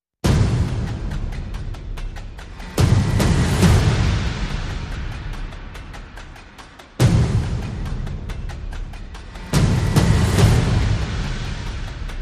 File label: Percussion Only